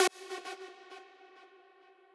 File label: lead